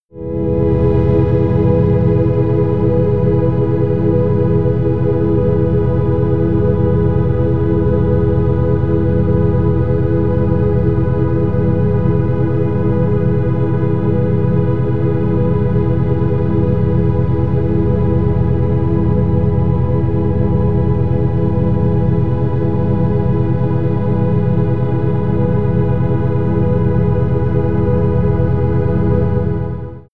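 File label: hallow air, pads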